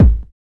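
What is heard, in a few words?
BASS DRUM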